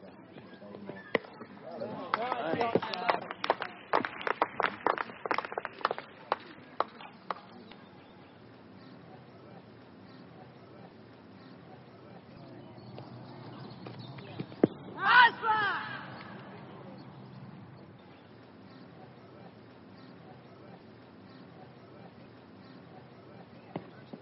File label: Mens Cricket; Sport